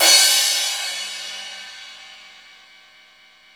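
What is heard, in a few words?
Sabian AA 15 crash; InVision Interactive; Lightware VOL-1; Instruments & Percussions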